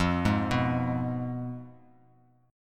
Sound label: F Diminished Bass Standard; Fdim arpeggiated; JGuitar